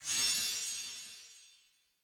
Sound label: freespin Start; fairymirror